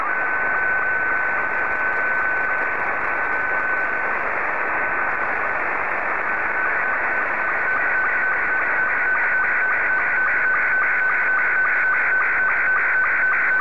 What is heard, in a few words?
sstv; VK7ZGK; VK4MSL SSTV RX @ QG62ln